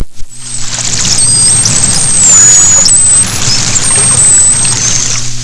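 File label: dolphin whistle sounds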